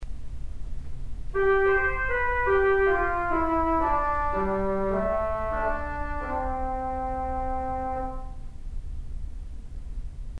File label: Tuba Trem, theatre organ